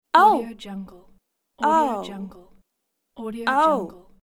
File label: surprised female oh uh aw